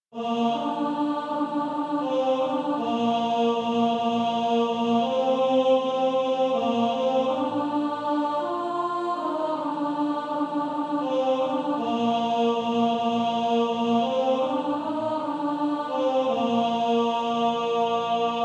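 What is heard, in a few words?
Male Choir, tenor 2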